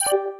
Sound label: Video Stop, ui; RED Hydrogen One